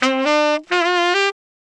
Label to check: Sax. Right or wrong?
Right